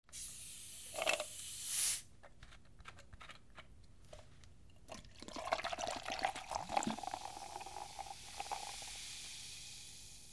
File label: KITCHEN